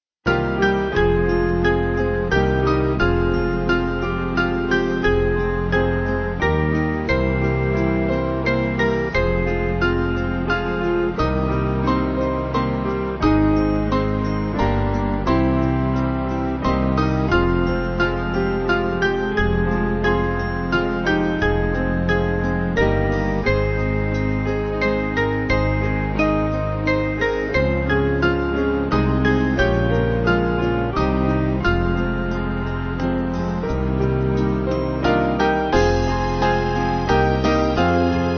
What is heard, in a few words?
Slow, Band; On The Journey; Columcille